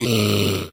Rise; Peace, Death; Fatecard

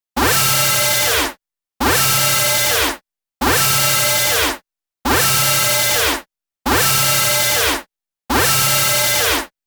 Warning; Darius 30th Anniversary Box

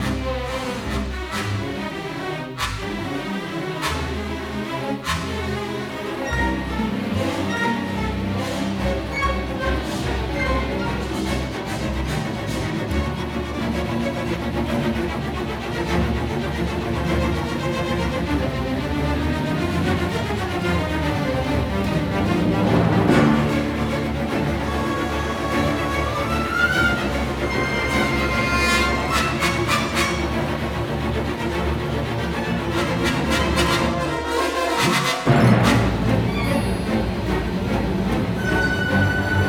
Probe Droid Tension Bed; Hoth Trials

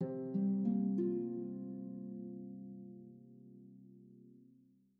Calm Harp; Super Mario Maker 2

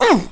Male Grunt

